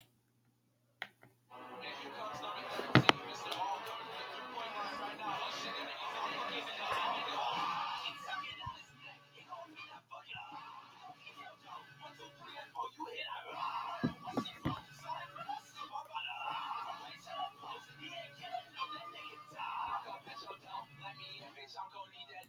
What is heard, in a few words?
rahhhhhhhhhhhhhhhhhhhh